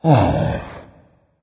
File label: sigh dragon; fafnir